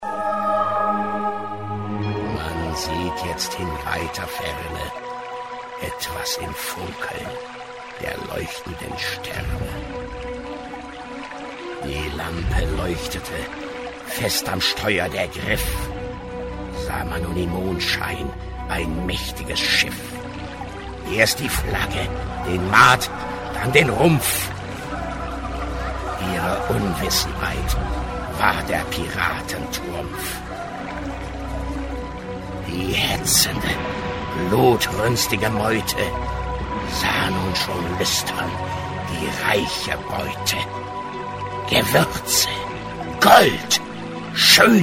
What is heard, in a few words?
Ballade